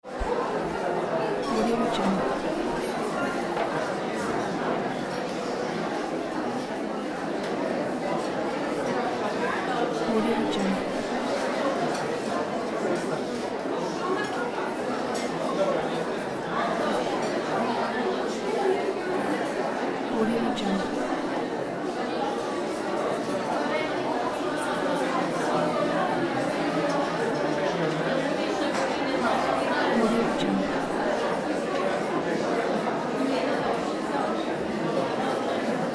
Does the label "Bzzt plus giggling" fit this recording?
No